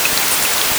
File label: pond